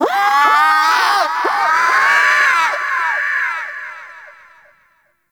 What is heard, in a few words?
Sea Bird Lure Call Success; Characters; Warframe; Ostron; Hunting Critters